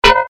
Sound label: evil pain; CAC ASSETS